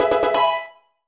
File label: level up fanfare; pokerogue